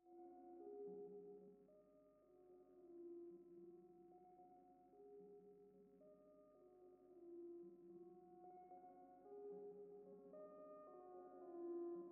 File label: Trumpet French Horn; TTSH Patchbook